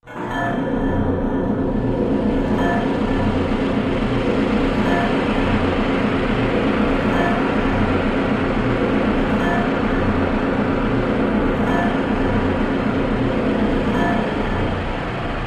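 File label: Hammer Slow Steady Metal, deep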